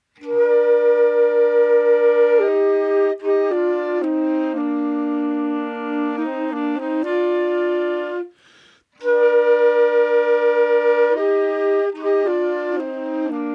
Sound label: Horn Tag Stereo Mix